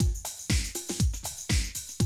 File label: Classic Drum Loops